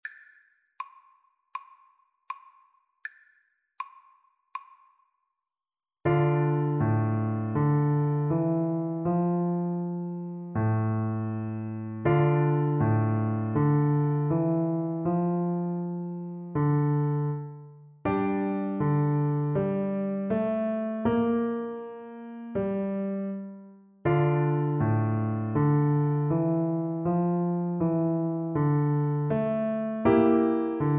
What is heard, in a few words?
Bassoon; bizet; perl fishers